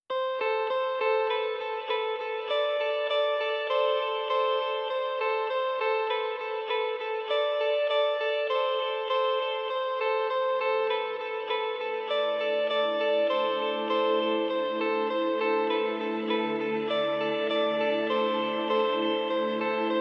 No Drums No SFX No Choir Mix

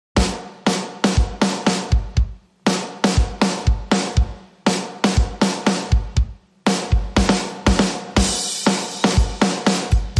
Drums Only

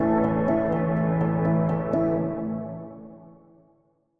Bittersweet Contemplation, Bumper

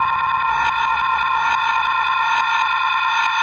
Pulse Contact

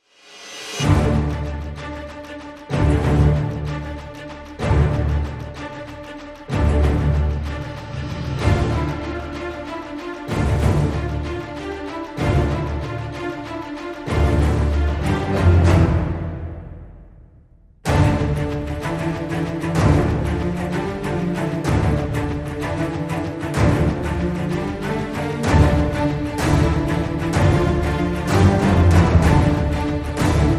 No Brass

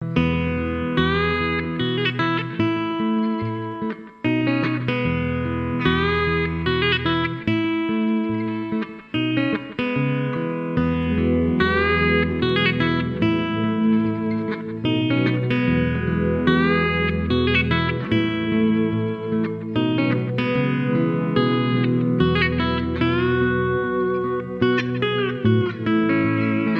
Electric Slide Guitar Ballad

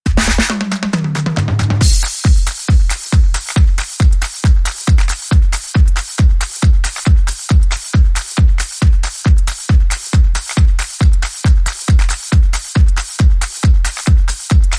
Beat

